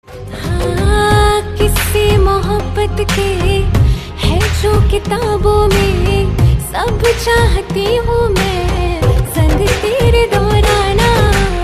Female Version Song

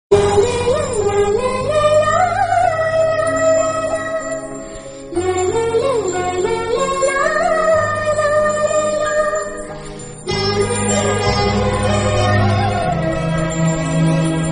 Instrumental Ringtone

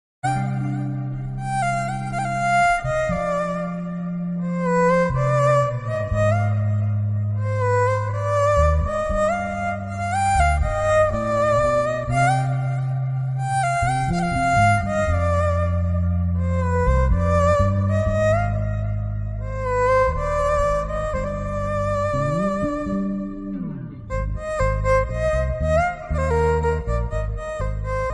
Telugu Love Beats

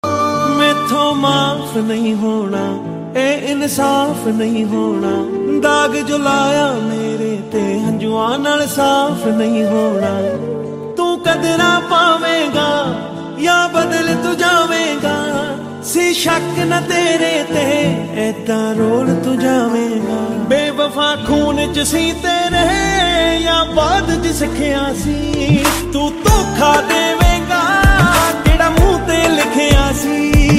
Sad Punjabi Song Ringtone

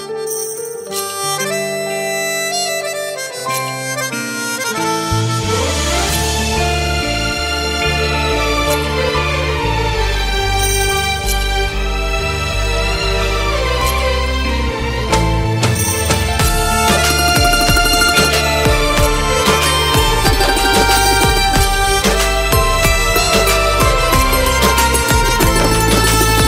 Song Instrumental Tone

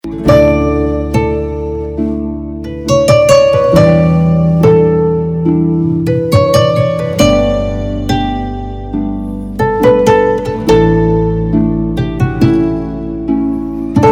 Instrumental Tone Ringtone

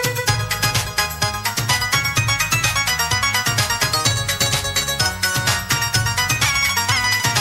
Message Ringtone

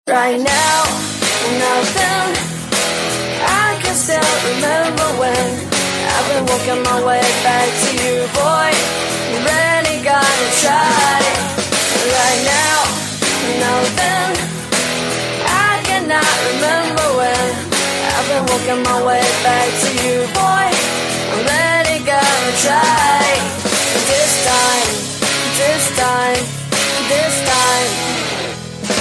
English Ringtone

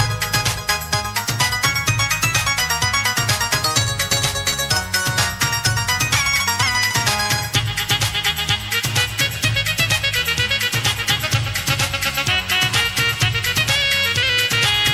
Instrumental Ringtone

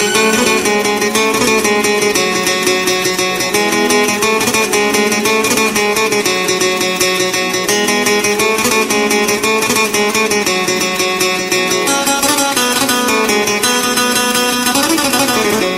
flute Tone; Judaai Movie Song

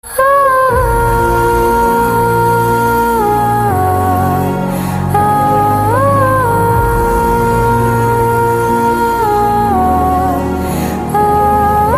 BGM Ringtone; KGF

